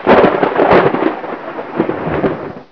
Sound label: THUNDER; Het Weer